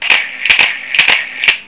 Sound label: leica r4 winder